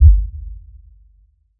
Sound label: Sub Impact